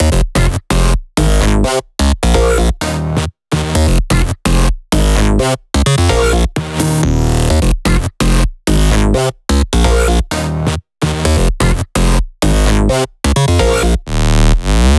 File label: 128BPM Melody Root F; Vengeance Samples; Neon Kit